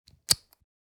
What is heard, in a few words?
Speaker connector adapter unplug; headphones and speakers